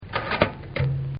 Toaster, Appliances; Household

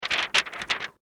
Paper Flap Sheet Shake Movement; Office; Materials

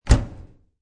Dryer Close, Dryer door shut; Door Closing; Sound Effects:Doors

